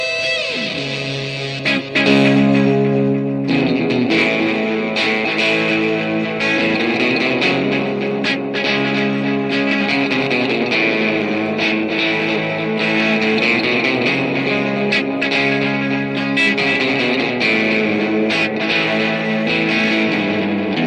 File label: chromatic riff